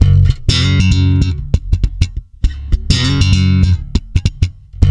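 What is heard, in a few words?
F#, funk swing, bass; Spectrasonics; Bass Legends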